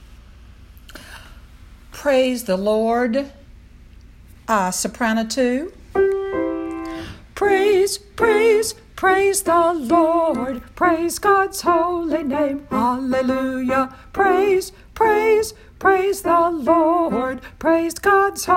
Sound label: Praise the Lord Sop